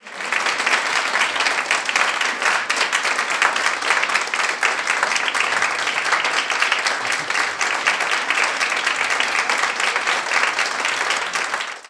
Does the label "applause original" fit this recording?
Yes